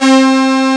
SLURWAVE

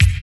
KIK TAMB, Dance Kicks; Roland L-CDX-01

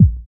DR. LOOP, DRUMS; X-Static Goldmine 3

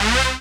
RAVE, RAVESYN

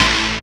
DR. LOOP, BUZZ; X-Static Goldmine 3

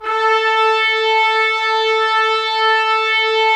Trumpets; Roland - LA Composer vol. 4

